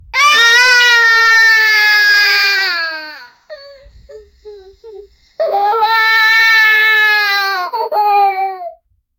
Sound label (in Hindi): 5 sal ka ledka jor, whimpering